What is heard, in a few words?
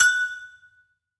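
quiz sounds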